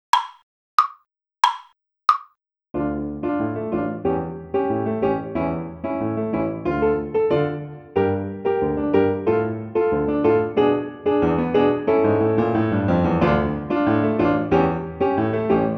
accomp; flutes grade 5

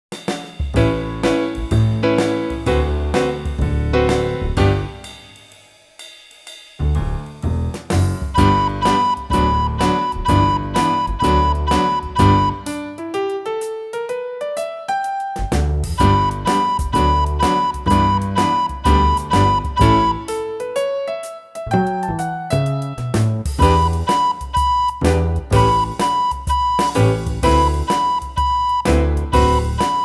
Blues, recorder; red hot recorder tutor

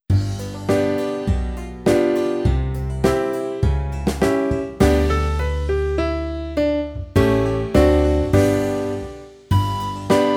Western Hoe Down Practice, recorder

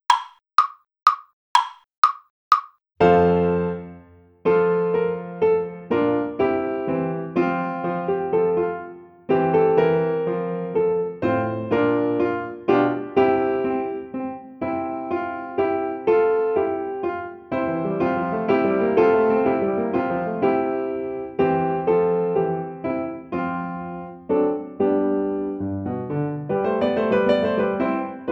Allegro accomp; Preussen; flutes grade 5

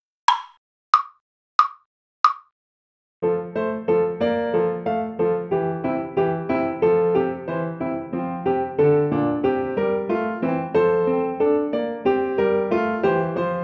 Allegro accomp; Handel; flutes grade 5

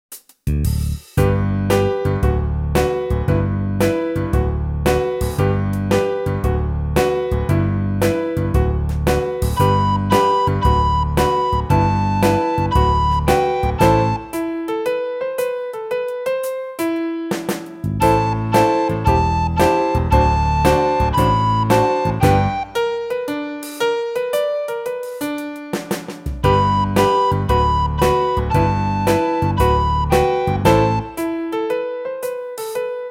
Swing; Skat King; red hot recorder tutor

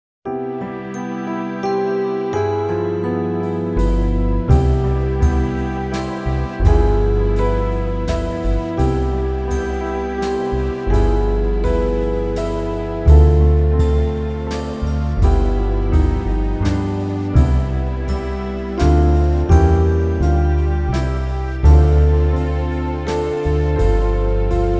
Waltz Practice; Razzamajazz Recorder Book 1; Kevin Mayhew

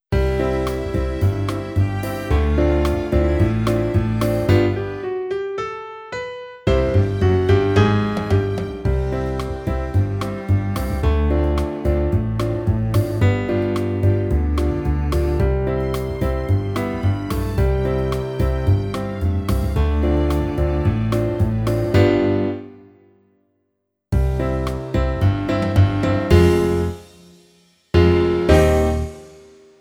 Tango, recorder

